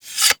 Dagger Sheath; Warframe; Weapons; Dual Daggers

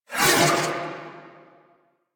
Proj Hip Whoosh; Warframe; The New War; Quests; Weapons; Omicrus

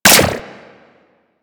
Capture Flag; Warframe; Ambience; PVP

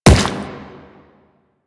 Step Rock, Footsteps; Warframe; The New War; Kahl; Quests

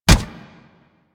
Kubrow Run Metal, Footsteps; Warframe

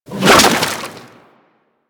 Kraken Tentacle Whoosh; Warframe; Warframes; Pirate

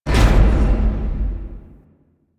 UI Round Complete; Warframe; Missions; Zariman; Zariman Assassinate

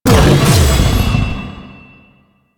Leap Cast; Warframe; Voruna

